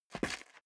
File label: step dress boots concrete grit single stair down; Footsteps; schritte